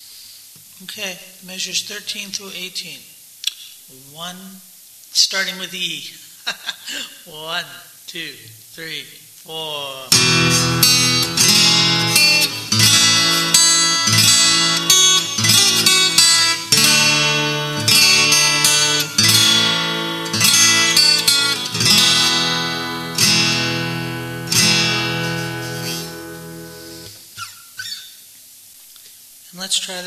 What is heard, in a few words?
DRUMMER